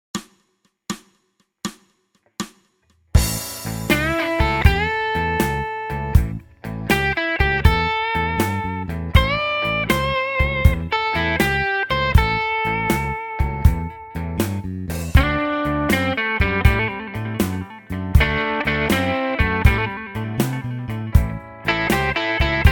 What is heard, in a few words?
blues